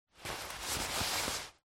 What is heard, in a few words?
foley jacket